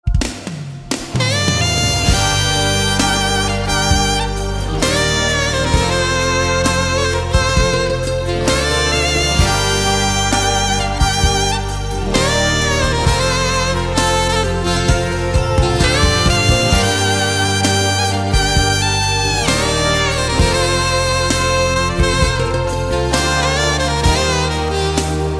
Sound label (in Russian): saksofon i gitara melodiya nochi